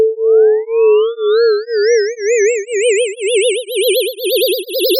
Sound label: wave